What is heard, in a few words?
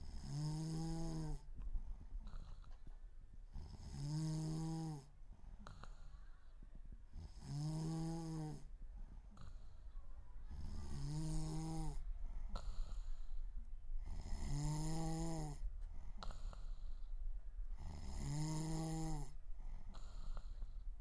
Snoring